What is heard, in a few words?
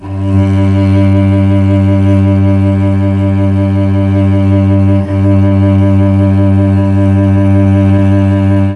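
G1 chamberlin cello; CHMBLN CELLO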